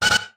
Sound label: Futuristic Weapons Sound Effects